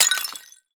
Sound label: ceramic crack